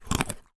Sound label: human eat bite crunch peanuts single